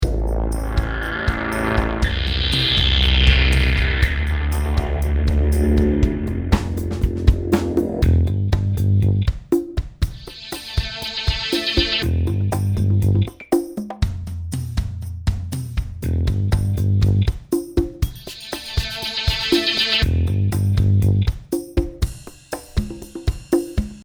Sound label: bass